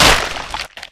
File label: Skeleton Bone Break; Angry Birds Seasons